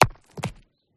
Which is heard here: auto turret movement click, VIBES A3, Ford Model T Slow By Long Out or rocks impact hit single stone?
rocks impact hit single stone